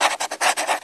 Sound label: writing